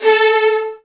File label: A2, Virtuoso Strings; ILIO; Kirk Hunter Virtuoso Strings VOL-1